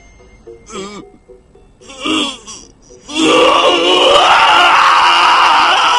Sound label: oooooooouuuuuaaaaaggghhhh